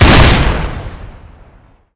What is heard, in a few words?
new deagle; garrysmod